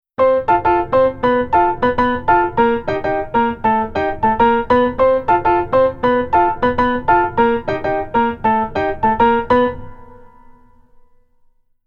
Keyboard Rhythm Pf Only

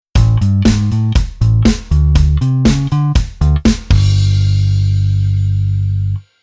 Bass Arr Move Oct Appro

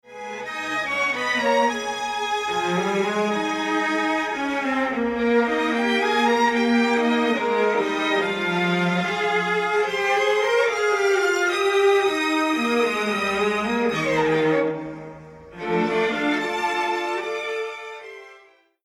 Strings Dual Sample Strings Only

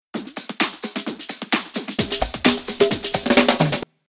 Rhythm Loop

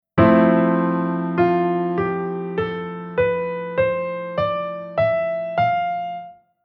Chord Chord Scale Major

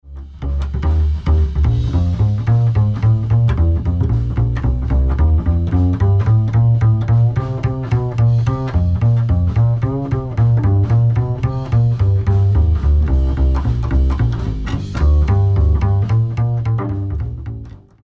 Mix Dyn EQ MB Comp Bass On

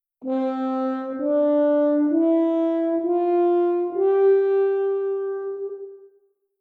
tenuto

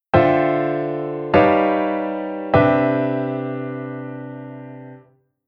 Chord Tension aug

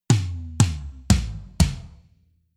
Metal Tom, Rhythm

